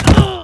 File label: fall; terror